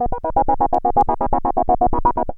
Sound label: 125, SYNTH SEQ; AMG; Global Trance Mission VOL-2